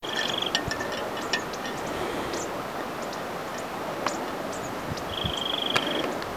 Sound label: wren